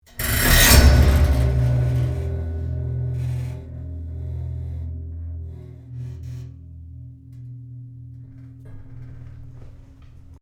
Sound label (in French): grille